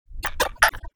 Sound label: jerky, spirit; slender; dbd